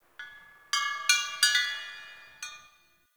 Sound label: Chimes, Ambience; Midrath Island; Fey Hub